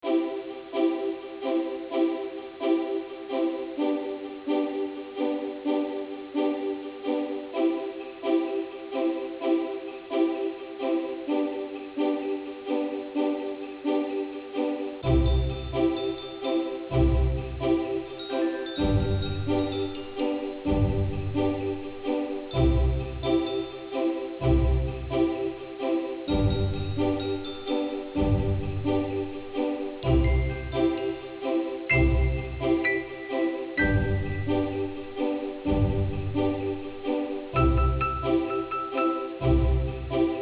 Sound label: jingle; talkswitch